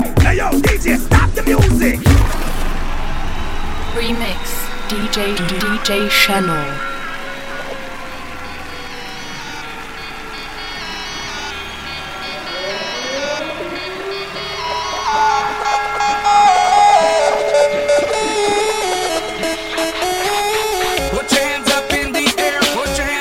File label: rumba party crash; reggae